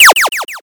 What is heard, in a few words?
laser